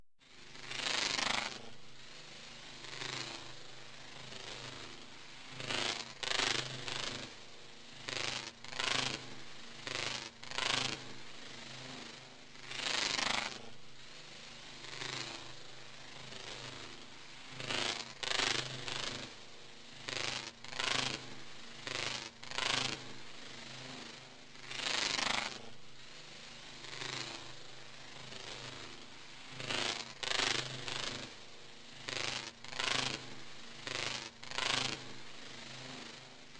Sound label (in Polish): pajak podklad; apocalipsis